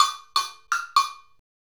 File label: Cow Bell; Sonic Foundry (Sony Creative Software) - Essential Percussion